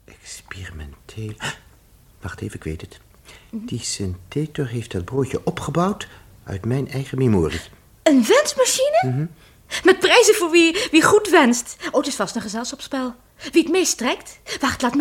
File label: Synthetor